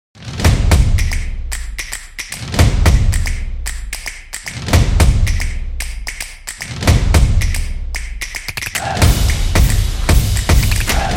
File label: Game Music, stomps and claps